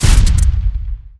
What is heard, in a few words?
evil noname machine attack impact